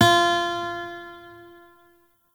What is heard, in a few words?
Shawzin Oct Two F; Warframe; Lotus Shawzin